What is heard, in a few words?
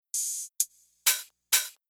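Rhythm 2 Step hi-hat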